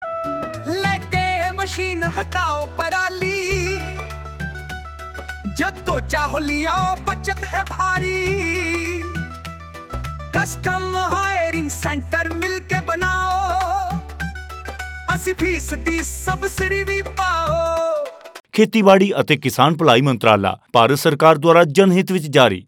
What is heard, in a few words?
punjabi, jingles